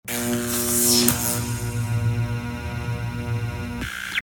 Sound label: zap noise